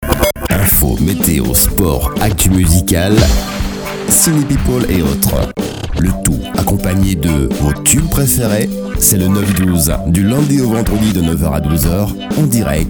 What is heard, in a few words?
jingle